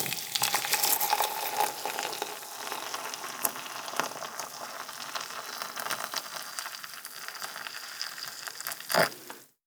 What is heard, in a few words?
Hot Water, Pour, Cup; UberDuo; The Home Barista